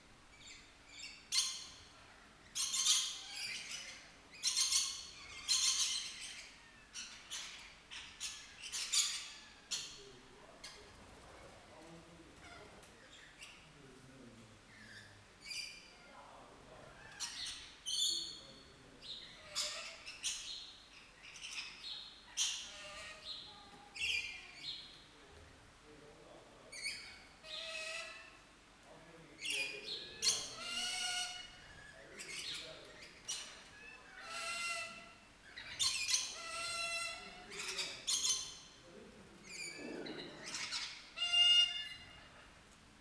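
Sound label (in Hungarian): csipogás szivárvány nyekergés a sárgadolmányos lóri; nyiregyhazi zoo 2019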